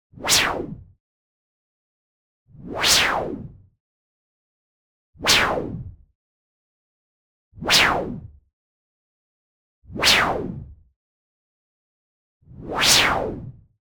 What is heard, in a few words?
WHOOSH White Noise Medium; boom library; Anime Essentials